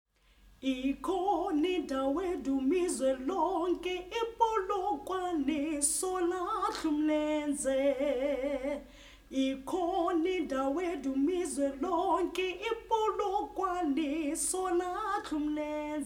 Soprano, gospel choir; Ikhoni Ndawo

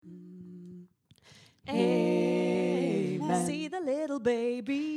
Christmas amen

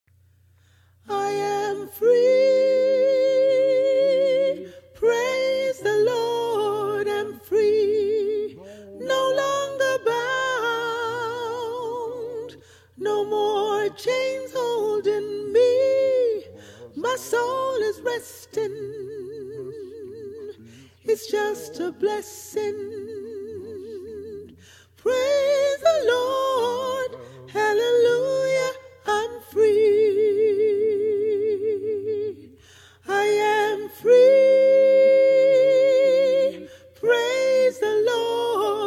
All Soprano, gospel choir

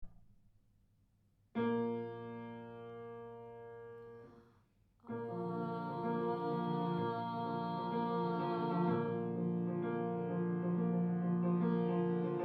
A Long Notes Low

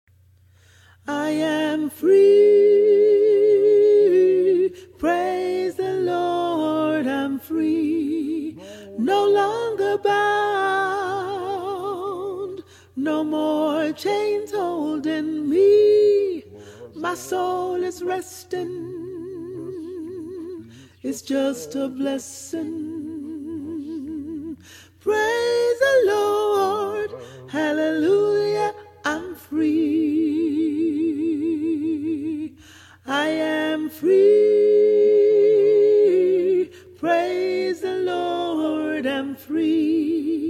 All Alto, gospel choir

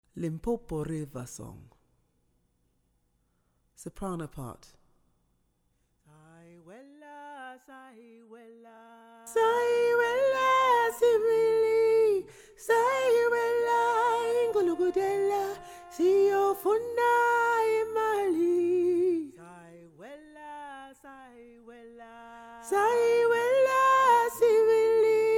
Limpopo River Song Sop

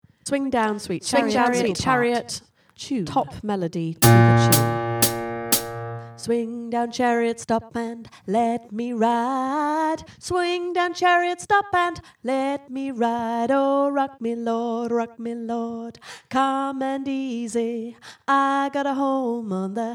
swing down whole thing

